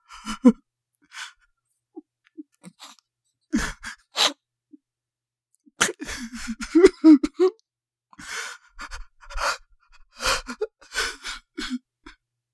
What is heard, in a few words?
Crying man whining or complaining cry